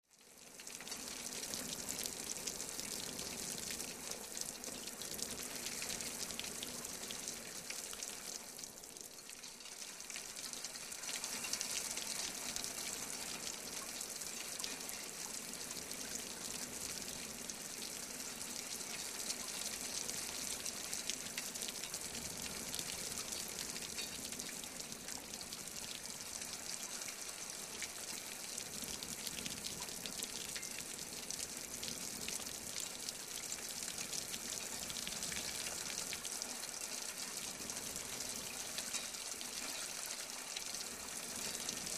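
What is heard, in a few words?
Water Dripping A Metal, drips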